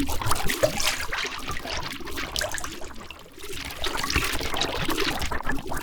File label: sfx skill, ZombieSkill SFX; IdleRPG2024